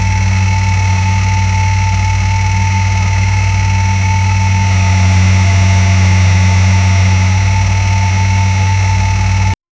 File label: realistic valmet tractor; vehicles